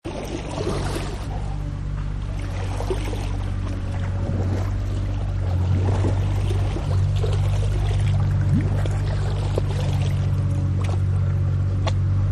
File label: Water Cruising Longboat; Boats; Vehicles; Partners In Rhyme